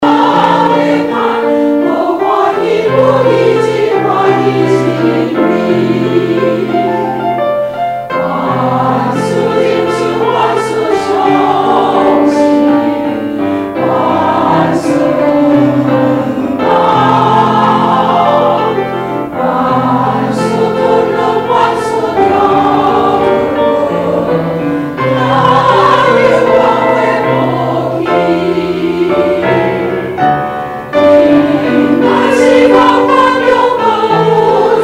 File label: Choir; taiwanese